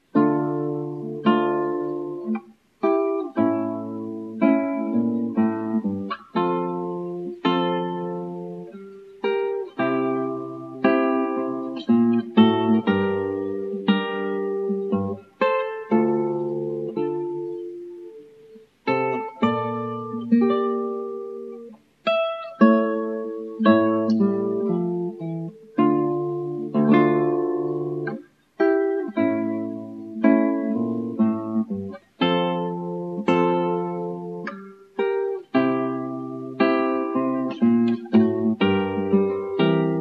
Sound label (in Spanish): Sarabanda